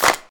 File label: Noisy Acoustic Snare Sample C# Key, Royality free snare drum sound tuned to the C# note